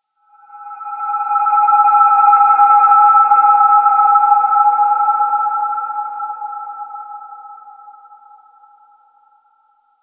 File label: Ambience Hub Hangar Satellite; Warframe; Tenno